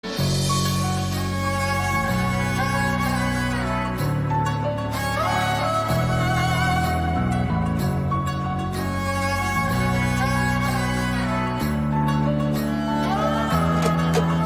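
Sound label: Love background music Telugu Ringtone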